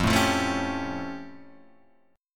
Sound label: A Augmented 7th F